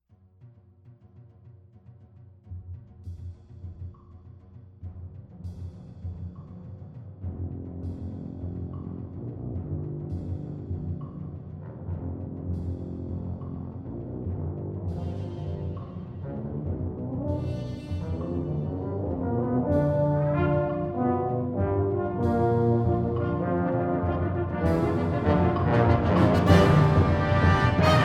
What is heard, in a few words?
Symphony for Brass and Percussion, Con Moto